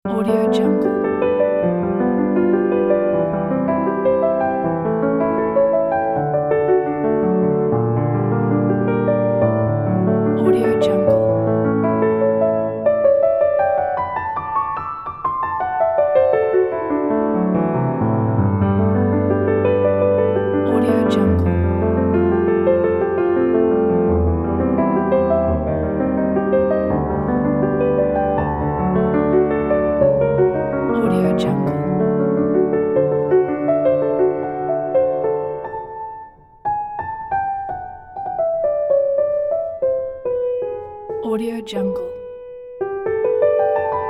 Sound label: elegance beautiful classical piano